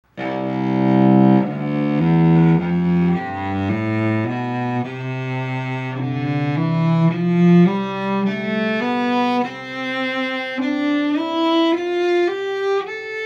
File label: cello; AC60CX Brown